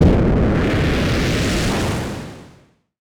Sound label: spell spawn; items